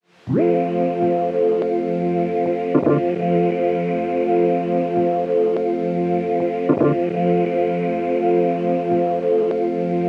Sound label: Tape Loop Pad - F Maj; Pads; Cymatics - Eternity Sample Pack